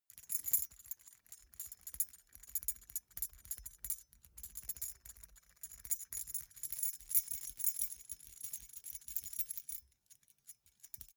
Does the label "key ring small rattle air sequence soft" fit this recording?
Yes